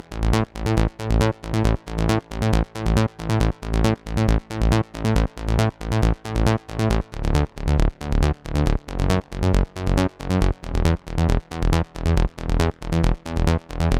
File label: Heavenly Kit 137BPM Bassline WET, A major